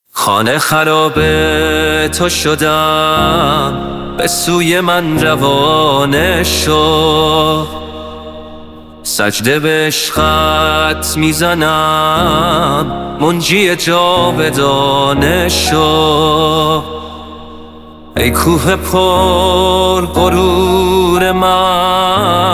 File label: Piano Version